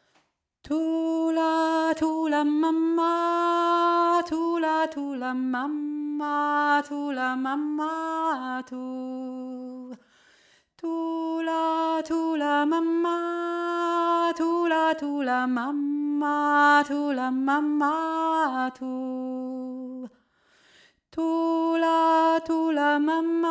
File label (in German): hauptstimme; thula mama